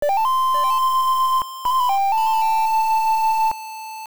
Flute; Play Dimitri's Flute; Oracle

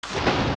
Stinger Dive; OOT

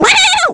Hit; Kargaroc

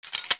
sword away; Put away the sword; OOT

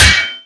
Shield; Link's shield blocking an attack (also used by Toon Link)

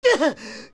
Fall Down; Link getting knocked to the ground; Adult Link

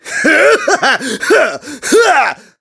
Multiple Slashes; Ghirahim; Impa's force-field; Skyward Sword

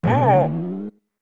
Jump; Goron Link jumping to pound the ground, with four different shouts

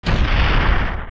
Enemy Bounce Long

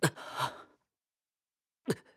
Die; BOTW; Breath of the Wild; Link

